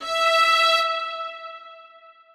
Strings kiai

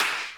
soft hit clap

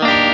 clean 5 7 6 7 5 Arpeggio down Dmaj7

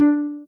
soft hitwhistle; osu

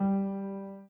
Piano Chord

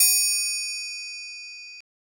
Triangle

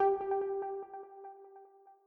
EPiano

